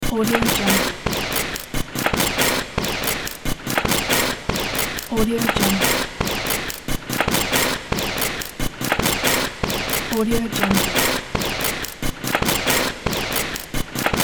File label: glitch industrial machine